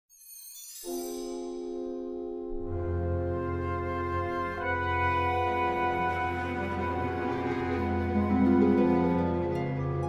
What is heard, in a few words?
Strategy Ambience; Master of Orion